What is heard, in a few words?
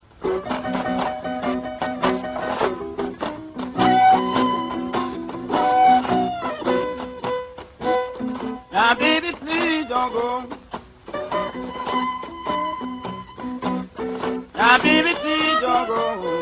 violin